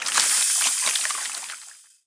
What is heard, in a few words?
item moisture tool sample